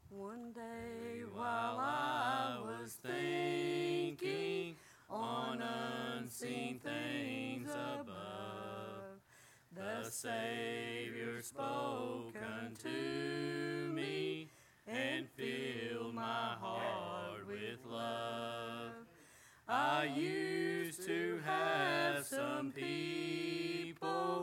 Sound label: singing